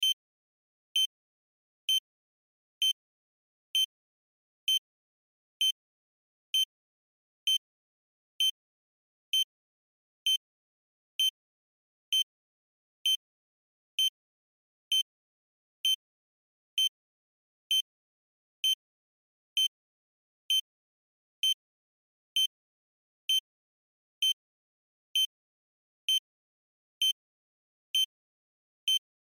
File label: Heart Monitor Vital, Heart Monitor Vitals Constant Beep Medium Rate Loop; Emergency